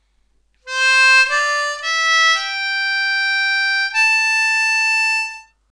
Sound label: 10 hole harmonica